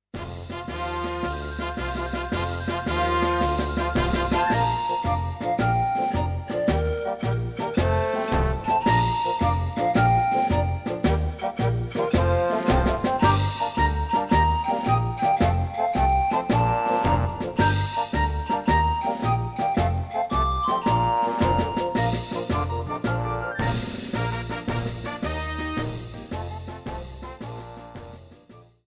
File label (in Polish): wersja instrumentalna